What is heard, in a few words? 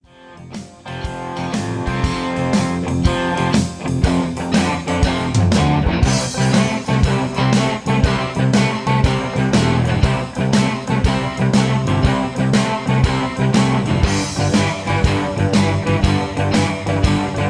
I-V-VI-IV Texas Boogie C 120; Peach Jam Vol 1 Part 2 Texas Boogie